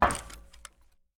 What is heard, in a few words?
metal footstep